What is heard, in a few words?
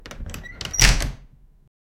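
trying to open locked wooden; attempt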